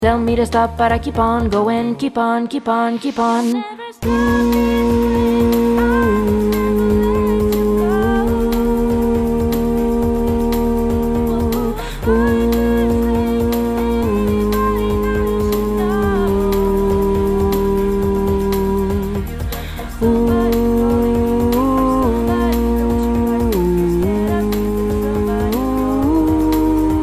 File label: Alto, ensemble vocal pop rock; ensemble vocal pop rock de larquemuse